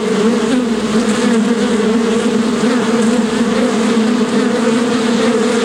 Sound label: Bee Many Loop; kult